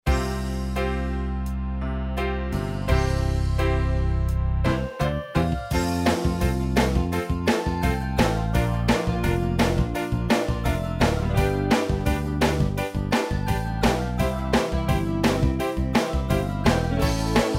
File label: Song When I was one karaoke